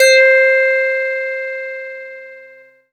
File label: SQ80 PLINK, Synthesizers; Soli-Music; World of Synthesizers VOL-1